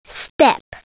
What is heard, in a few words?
step